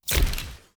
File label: camera shot flash, camera shutter